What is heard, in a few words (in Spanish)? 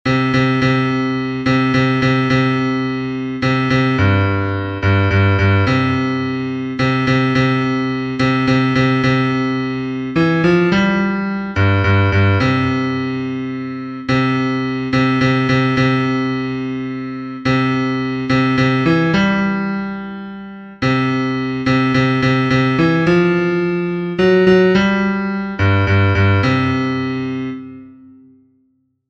BAJO, himnos en piano; cantos del camino